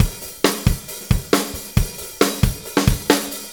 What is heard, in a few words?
Drum Tools, Loops